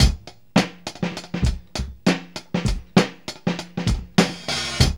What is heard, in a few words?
slick beat 100; Zero-G; Funky Elements